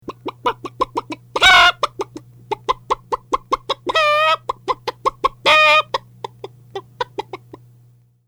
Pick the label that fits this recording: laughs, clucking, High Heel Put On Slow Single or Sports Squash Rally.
clucking